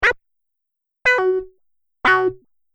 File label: Multimedia Small Sound Pack, high tech sound effects